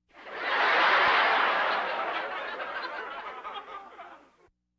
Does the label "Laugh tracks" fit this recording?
Yes